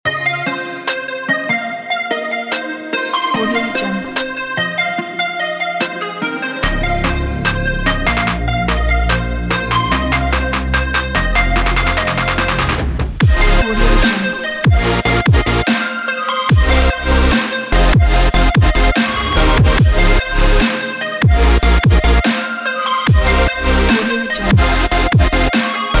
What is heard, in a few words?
the future bass